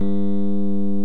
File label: Electric Pianos